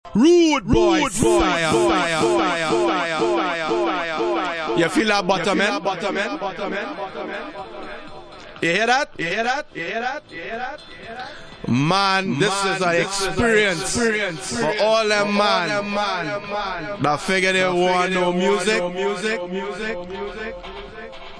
acapella; house

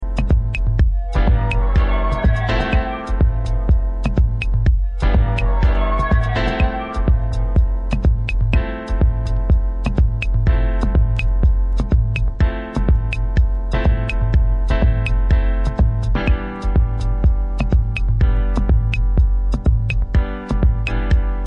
piano

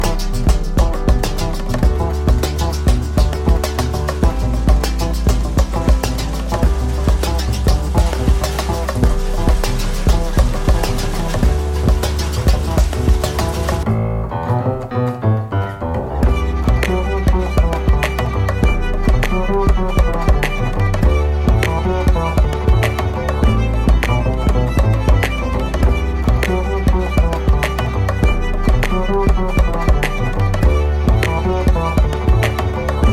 Beats (Instrumental)

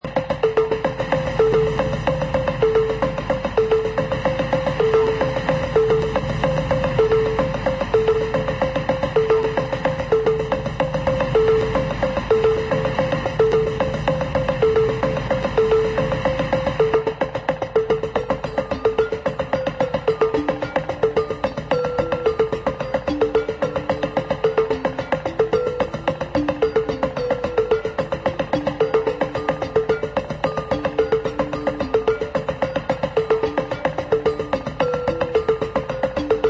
monochordium

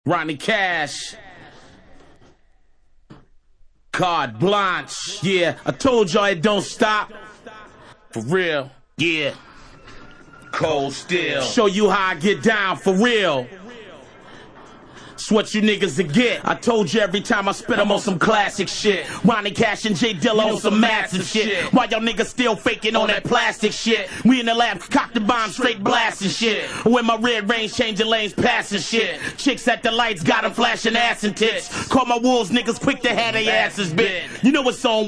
cold steel (acapella)

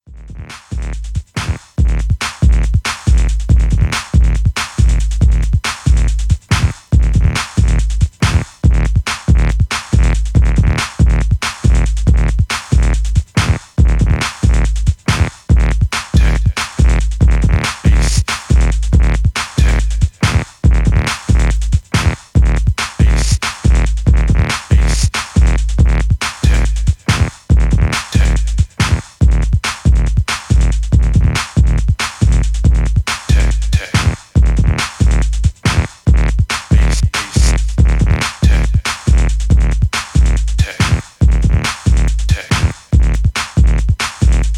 Bass Tech

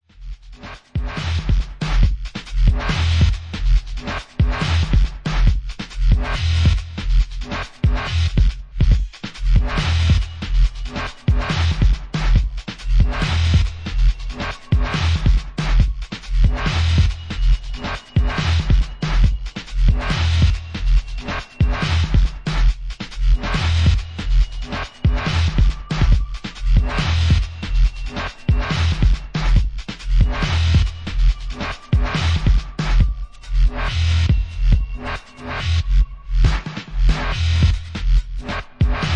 Static

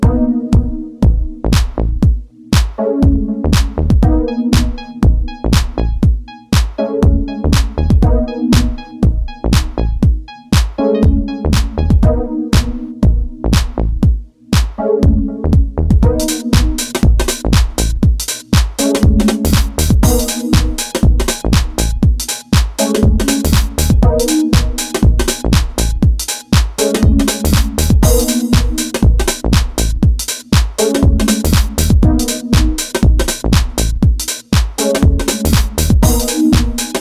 Instrumental

